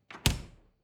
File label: refrigerator door close hard